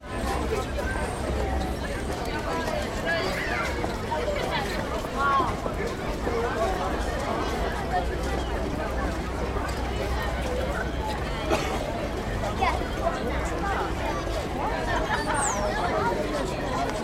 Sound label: ambience london the queens walk outside cafe